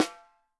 4X10 SOPRANO, Drums; Sampleheads; Peter Erskine Living Drums

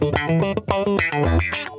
Funk Guitar; 90 s Sample CDs; Zero G

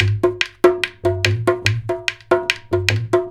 DHOL, Indian Traditions; USB Soundscan vol.45 - Indian Traditions; AKAI

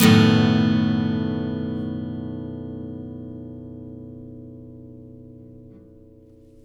BARI CHORDS, Blues Guitar; ILIO; Fretworks

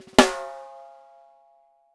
6X12 WOOD; Sampleheads; Peter Erskine Living Drums

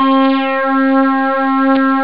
horn; jazz jackrabbit 1; M8

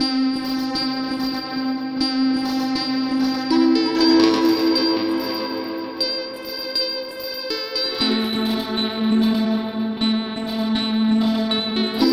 Synth, Modular Electronica; Sonic Foundry (Sony Creative Software); TSS Autopilot

